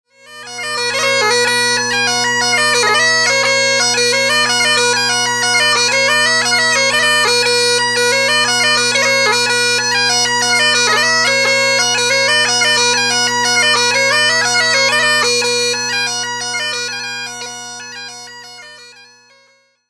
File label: jig